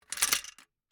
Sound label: Gumball machine, metal, glass, candy, switch, mechanism, rotate, dropping, wide; Eiravaein Works; Vaeyan IV